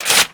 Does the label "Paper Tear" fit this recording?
Yes